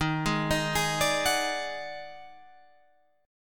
Eb Minor Major 7th Double Flat 5th, Guitar, Open G